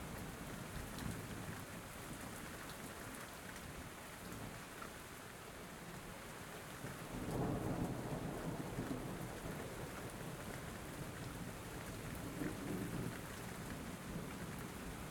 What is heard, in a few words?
Tempestade - Storm Loop; Super Mombo Quest